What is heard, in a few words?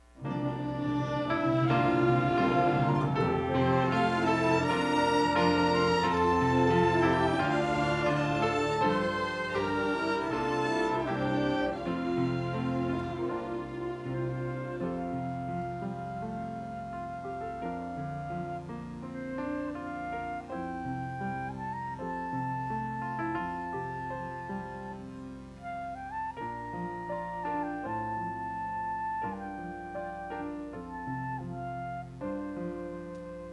orchestra